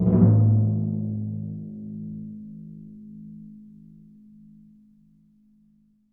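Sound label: timpani A#, Synclavier Percussion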